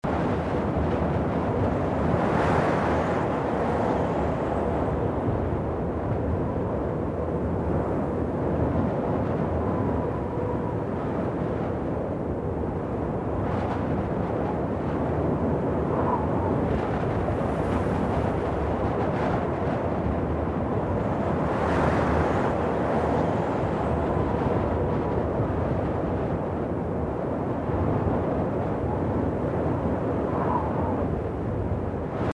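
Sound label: Wind Interior Gusts Muffled Loop; the unfinished swan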